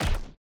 block Repair; Mindustry

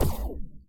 shoot Elude; Mindustry

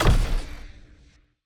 unit Create Big; Mindustry

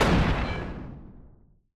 shoot ripple; Mindustry

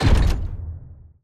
payload Drop; Mindustry

